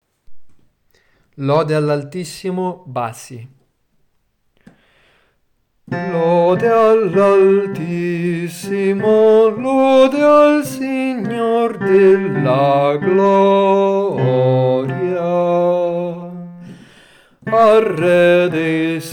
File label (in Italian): bassi